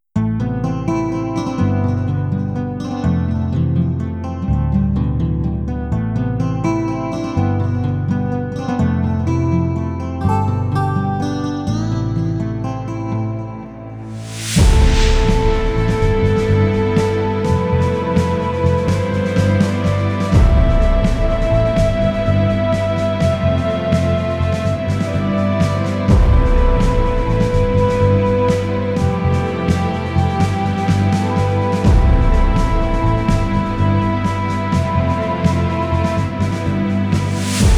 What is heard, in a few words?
Trailer Drama; garageband